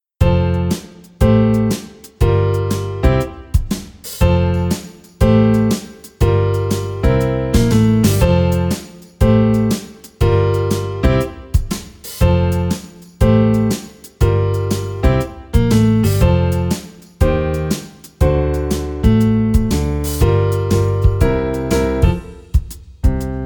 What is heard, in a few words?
Play-Along SWING